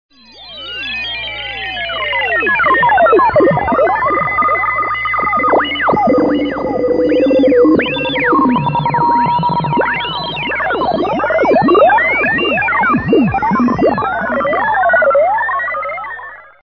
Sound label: Frequency down